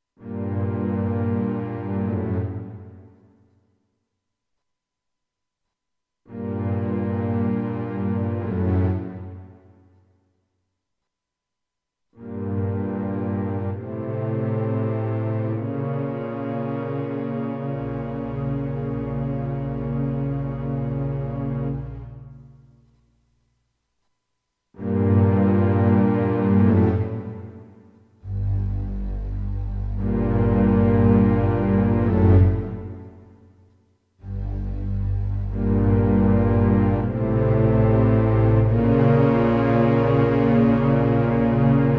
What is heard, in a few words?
Quiet Tension; Dark/Ominous Music